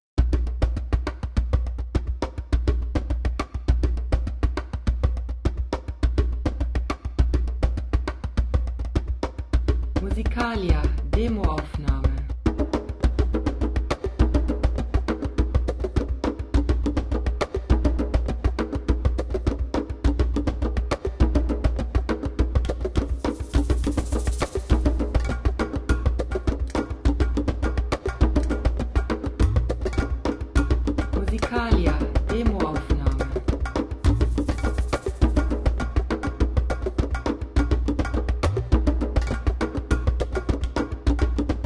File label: djembe groove